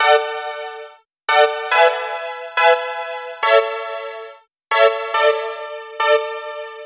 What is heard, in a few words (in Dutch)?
loop, strijkerloops